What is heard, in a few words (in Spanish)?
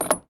CADENAS; SFX A CADENAS END 2